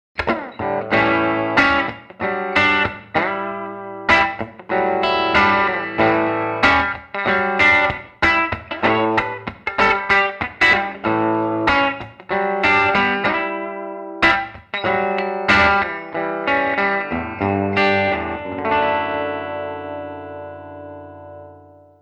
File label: Clean